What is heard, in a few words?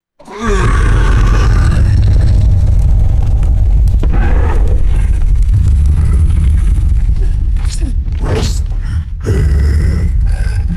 distant heavy monster growls echoing; creatures